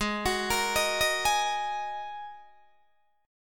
G sharp 7th Suspended 2nd G sharp Guitar DADGAD

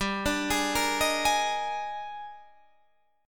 D sharp Major 11th, G, guitar chord

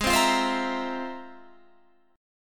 F Minor Major 11th

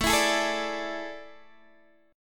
A Major 7th Suspended 4th, Guitar, DADGAD

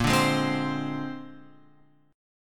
A sharp 9th, chord; A#9 Chord {6 5 6 5 X 8} strummed

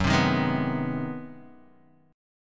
G sharp 7th Sharp 9th

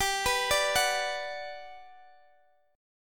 G Major 7th, G, down; Listen to GM7 arpeggiated; GM7 Chord

